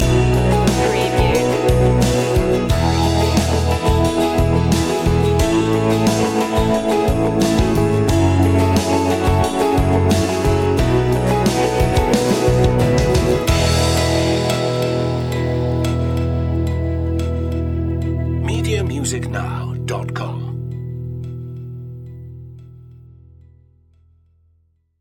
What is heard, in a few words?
acoustic driving day